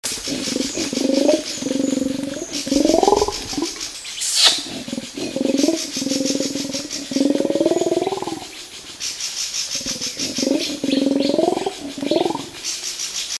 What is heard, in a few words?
Complex Call